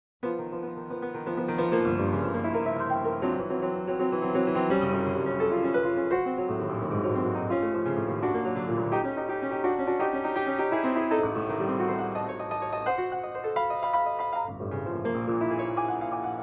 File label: Steinway Preludes Thunderous